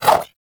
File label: Infested Throwing Star Whoosh; Weapons